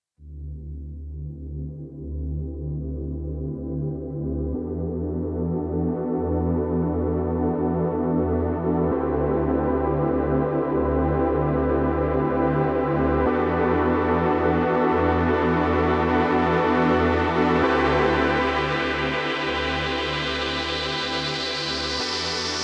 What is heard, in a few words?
Filter MOD Sweep